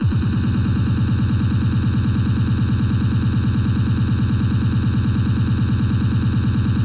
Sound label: Break 140 bpm